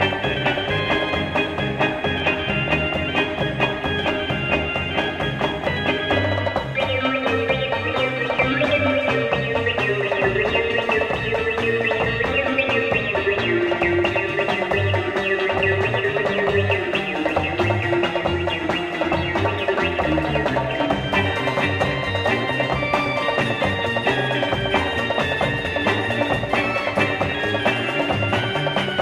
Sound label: Arabic Music